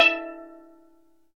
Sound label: steel drum